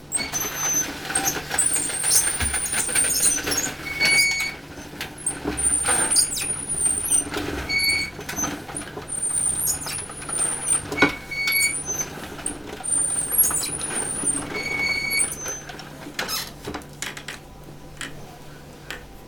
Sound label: Mechanic Squeak